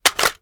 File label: reload empty fast magout; viper